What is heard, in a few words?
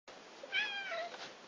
cat voices